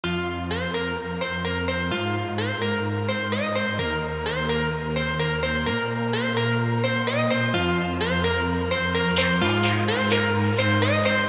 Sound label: phonk remix